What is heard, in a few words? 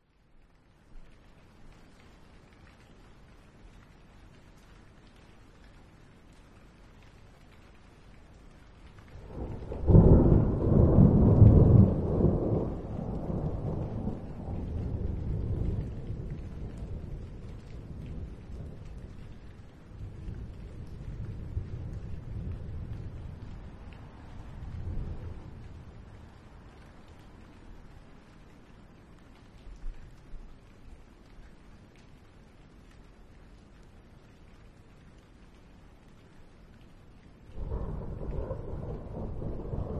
nature thunder distant or high above very light rain; zapsplat